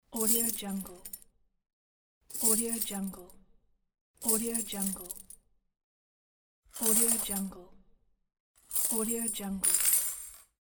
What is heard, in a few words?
chain